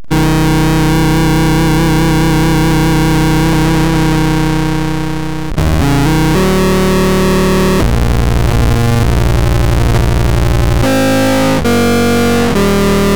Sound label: oct fuzz run